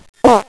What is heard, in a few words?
fart